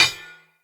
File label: Ambient Blacksmith Hammer Impact; Midrath